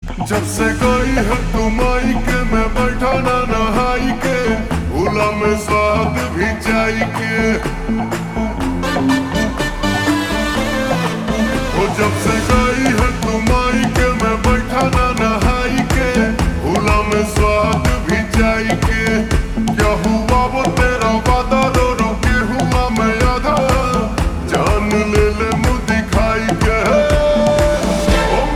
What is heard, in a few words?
Slow Reverb Version Ringtone